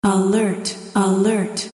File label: Alert